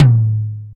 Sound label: mid tome drum sample a sharp key